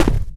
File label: charger run left, footsteps; player; source engine; left 4 dead 2